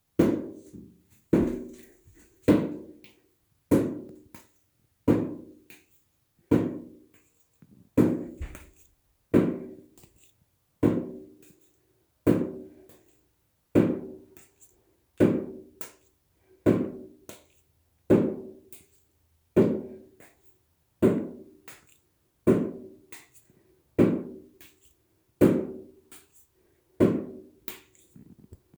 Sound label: Bouncing Ball